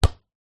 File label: sport soccer ball bounce hard ground